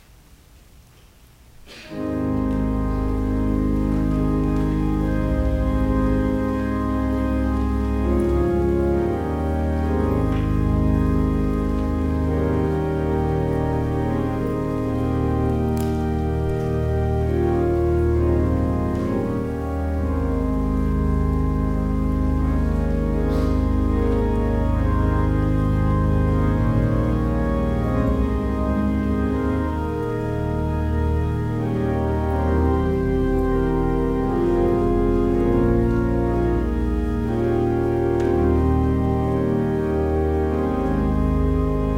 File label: Organ Music